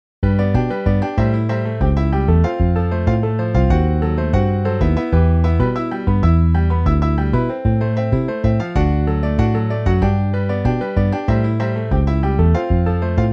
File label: Piano Bass; MIDI